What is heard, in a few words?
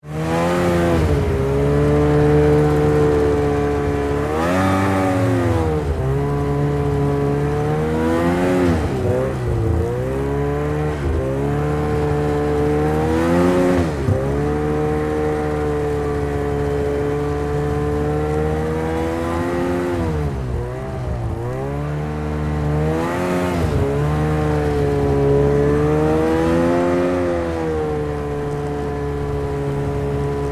Snowmobile Ride, Snowmobile, Ride, In, Circles, Shut, Off, Yamaha, Exciter, 440; Sports; BlastWave